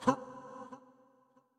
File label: Toxic Mask Small Effort